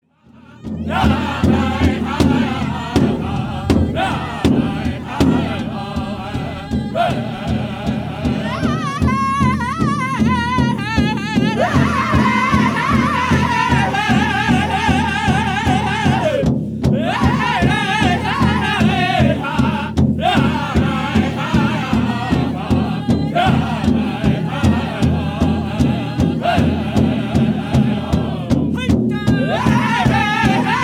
Jingle Dress

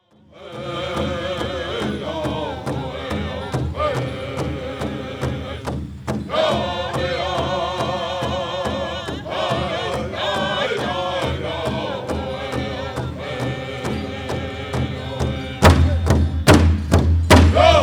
Ponca Song

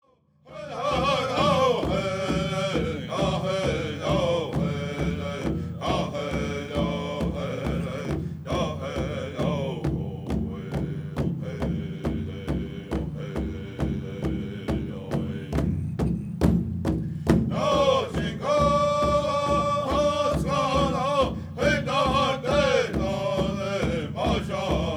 Flag Song

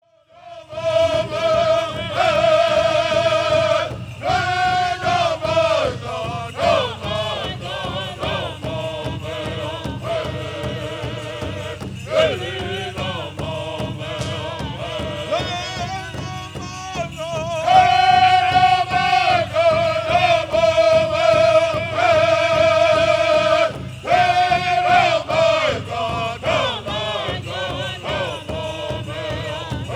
Old Ponca War Dance Song

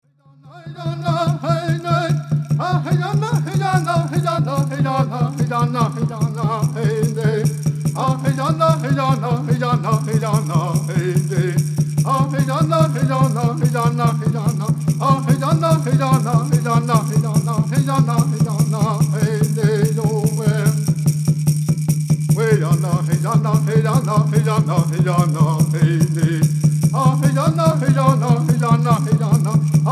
Native American Church Song

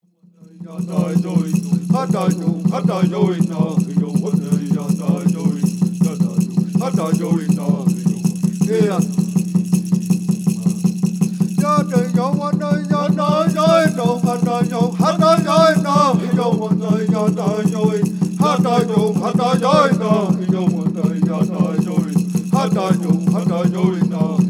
Ceremonial Song

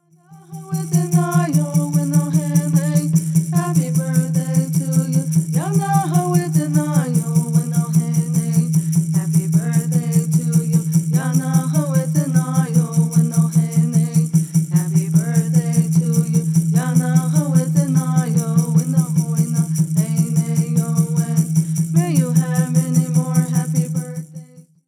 Peyote Song

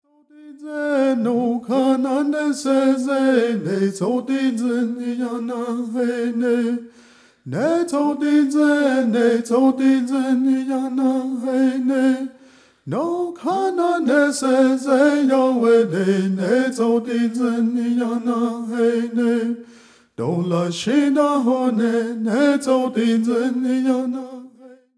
Prayer Song

